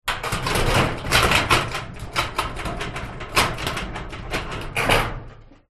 impact metal loose corrugated gate wobble hard movements